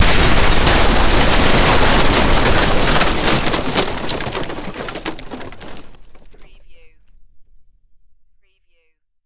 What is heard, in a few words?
Explosion House; Sound Effects Bible